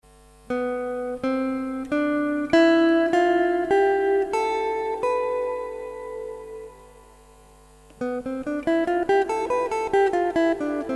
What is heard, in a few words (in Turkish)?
lokriyen